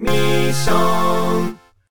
Jingle Mission; Dandy Dungeon Legend of Brave Yamada

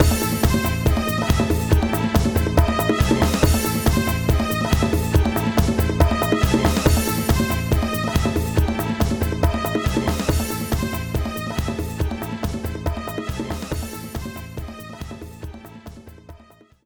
Magnet Groove; mario rabbids kingdom battle the complete gamerip switch gamerip 2017

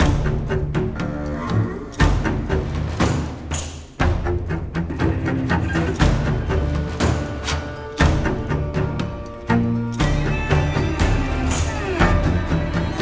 combat scotland loop

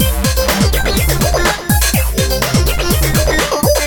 Electro; BeatBlasters III

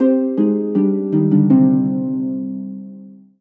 Solo Harp Negative; MUSIC EFFECT; startide

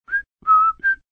Whistle; F.A.M.P.S

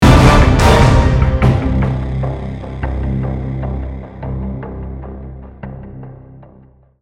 Mx Combat Outro Short; Mx He Combat Dudebro Outro Short

